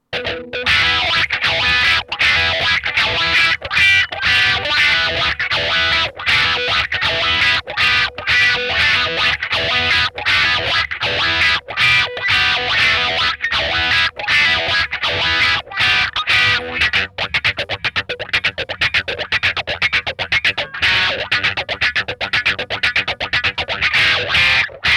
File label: wah